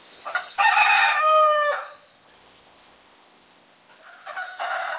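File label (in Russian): одиночный крик большого петуха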